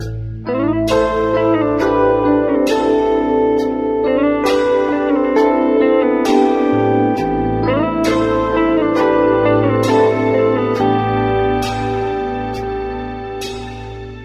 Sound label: guitar, Loops, Sad